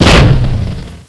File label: buffalo shoot, weapons; wanted